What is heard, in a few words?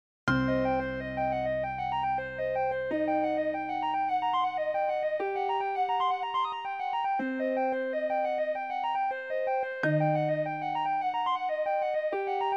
lots of blues notes